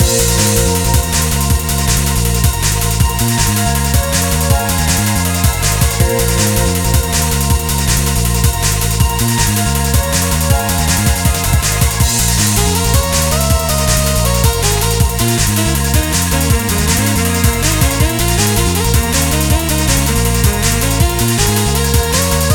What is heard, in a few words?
Music title loop; itgmania